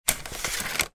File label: household vacuum metal hose arm return; sound effects one